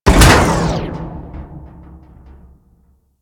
Injection Arm Mover Stop; Ambience; Warframe; Grineer Ocean; Ocean Sabotage Injection

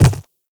Infested Footsteps Quadruped Medium Walk Default; Warframe

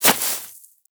Rodent Hop Step Rear Grass; Warframe; Forest Rodent; Foley

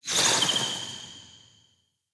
Bow Projectile Flock; Weapons; Player; Bows; Fey Thorn Bow

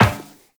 Run Metal Perforated, Footsteps; Warframe; Tenno

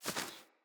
Wing Flap One, Animals; Floppet

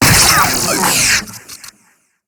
Death; Warframe; Raptor; Battle Barks; en

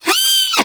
Snow Critter Vocal Pain; Hunting Critters

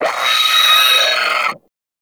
Sentient Brachiolyst Death; Warframe

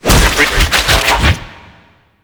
Shield Burst Cast; Warframe; Infested Lich; Hard Drive

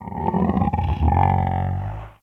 Venus Landscape Mushroom Creak Alt, Ambience; Warframe; Venus Open World

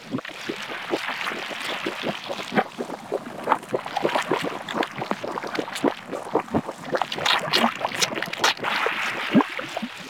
Infested Eris Interior Caves Vein Loop; Warframe

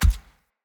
Step Default, Animals Foley Steps; Bromius

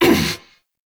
Pain Grunt; Stalker; Warframe; Jade Shadows

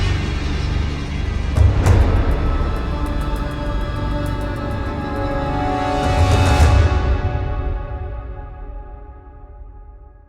Opening Cinematic Cue; Warframe; Deimos

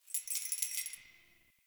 Keys Jingle

